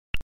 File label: keypress standart